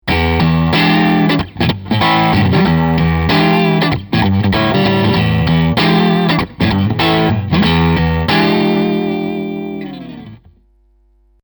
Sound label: crunch neck